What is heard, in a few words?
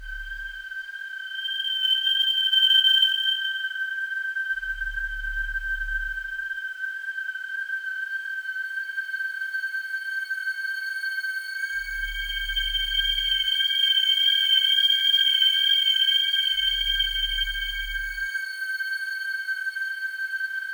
Loop; Heroes Over Europe